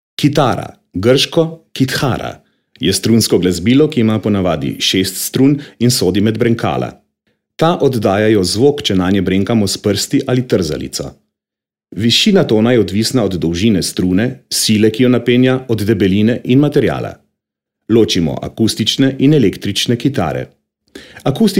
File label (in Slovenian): kitara; slovenscina